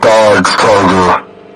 Dodge Charger

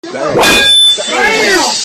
metal bat clang

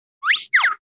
sharp wheep and doubled zip down

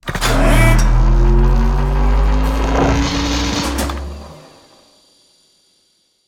startup machine

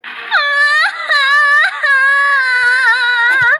crying

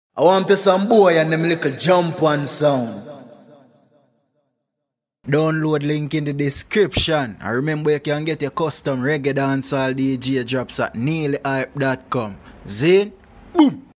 drum pan sound; bblack mischief

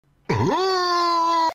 laugh

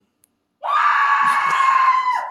screaming

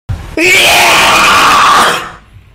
godzilla sneej

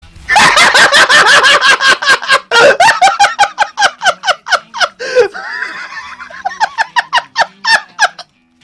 laugh loud; pink ward

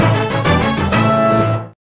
random game fanfare

